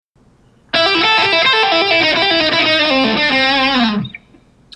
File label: pentatonic shred 150, guitar